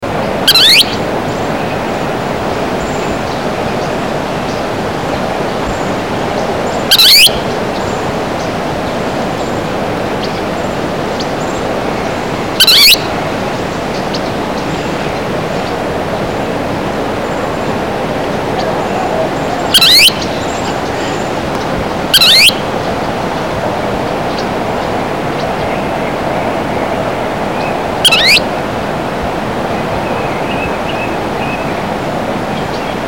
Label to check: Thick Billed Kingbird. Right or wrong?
Right